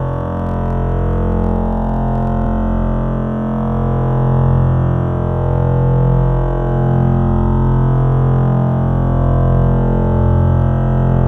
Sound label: drone; Nurykabe